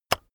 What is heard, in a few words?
keyboard external enter release heavy